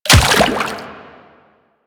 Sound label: Step Water, Footsteps; Warframe; Quests; The New War; Kahl